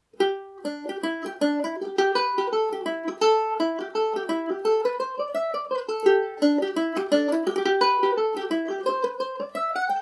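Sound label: G major, irish mandolin; Glen Allen; G&O #34 mandolin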